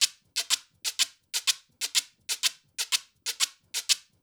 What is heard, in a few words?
percussion loop